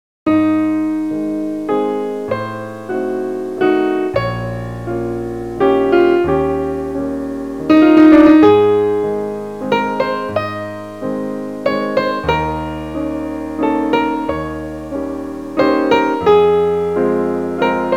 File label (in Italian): musica danza